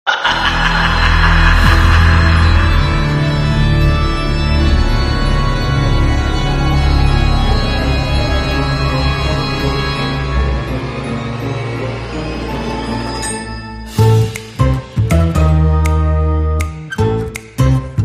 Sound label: Frightening Foyer Music